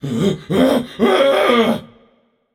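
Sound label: non verbal fear, voice; cernunnos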